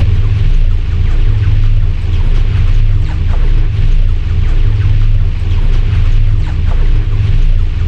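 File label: armadillo defensive ball curl; rammus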